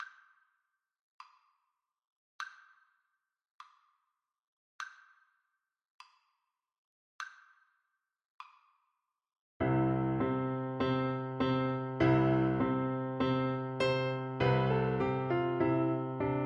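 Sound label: recorder karaoke; beethoven theme op76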